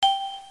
chat receive; Dice World

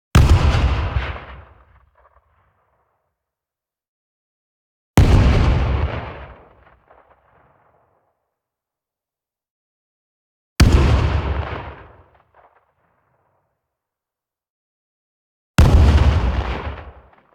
EXPLOSION SHORT Nice And Round; boom library; Anime Essentials

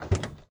Ferrari Testarosa Door Open; Automobiles

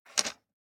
DICE on Plastic, Grab, Standard, 5 Five Dice; Samples; ArticulatedSounds; DICE Sfx Party (Extended)

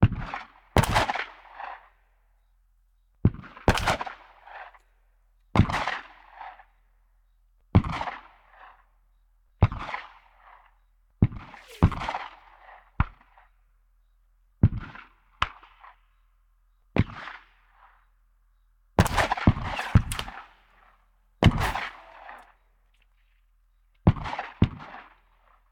War Ambience Gunfire; Sound Ideas; Guns

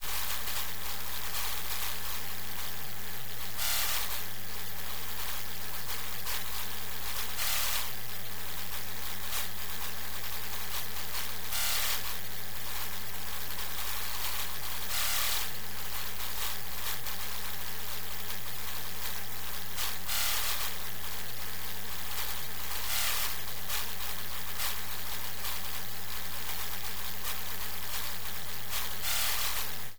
NEON TEXTURE; boom library

